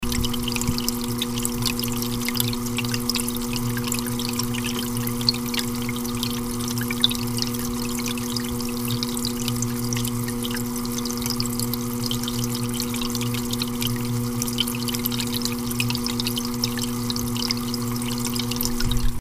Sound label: fishtank